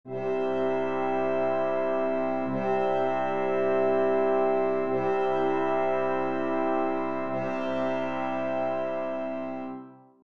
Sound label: brass; Nikolai Rimsky Korsakov